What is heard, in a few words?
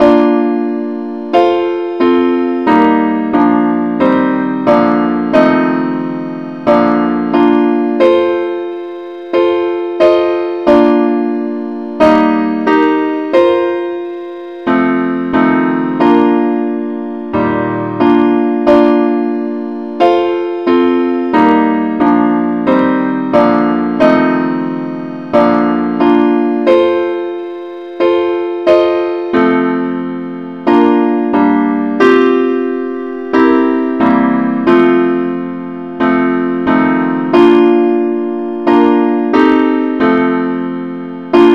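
advance Accompaniment key B flat; Gwalchmai; Glory be to God on high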